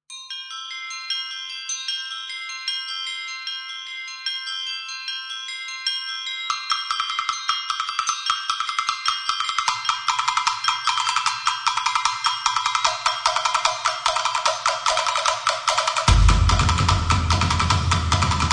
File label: bells, drums